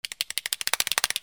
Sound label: TOYS